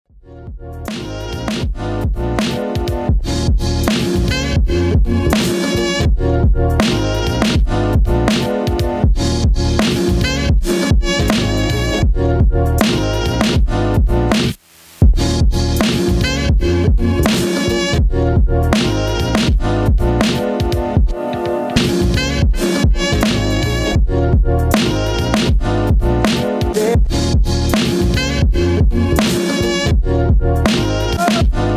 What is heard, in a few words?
Bonus Beat